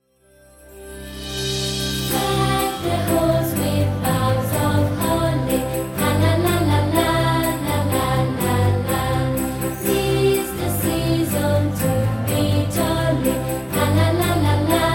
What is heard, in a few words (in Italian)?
soprani